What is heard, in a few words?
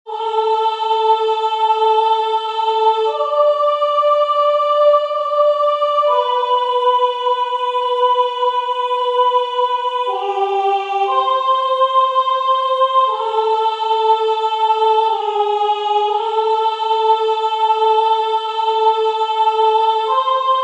verset 1 2 choeurs Soprano; Monteverdi; Vespro; Ave maris stella (vespro); Baroque